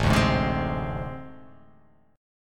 A Minor Major 7th Double Flat 5th, down

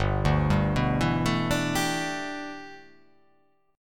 G 6th Add 9th G, chord

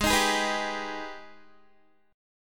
G sharp Minor Major 7th G sharp down Guitar; G#mM7 strummed

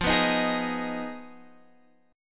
F Minor 7th Flat 5th, Guitar, Drop D; down; chord shape

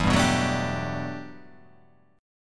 E-Minor 11th, Em11 chord; strummed; JGuitar

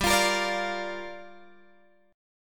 G Minor 7th, Guitar, Open G

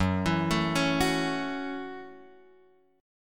Gb Minor 7th, Guitar Open F; arpeggiated; JGuitar

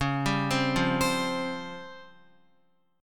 Db Major 7th Flat 5th, Guitar; x,4,5,5,5,7; DbM7b5 arpeggiated; JGuitar

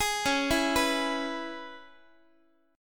C sharp Minor 7th C sharp Guitar; C#m7; arpeggiated; JGuitar

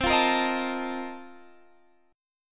A sharp Major 7th Suspended 2nd Suspended 4th

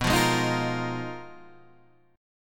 G sharp Minor 6th Add 9th B, guitar chord

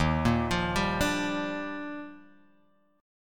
D sharp Major 9th, Guitar Drop D

